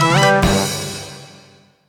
accent, musical; 1000fx

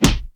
PUNCH, FIGHT; 1000fx

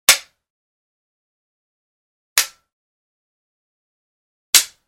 Samurai Sword Hits; Hollywood Edge Martial Arts and Human Impacts

